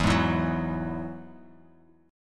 E Major Add 9th E down Bass Standard; strummed; JGuitar

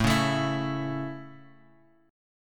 A Major, Guitar, Open G

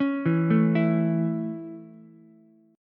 Db Minor Sharp 5th Db Ukulele; arpeggiated; Dbm#5; JGuitar

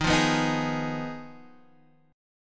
B 6th Flat 5th E flat, guitar chord

